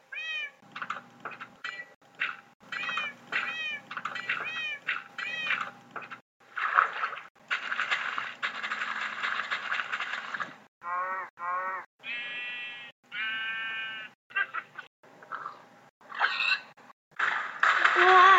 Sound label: minecraft sounds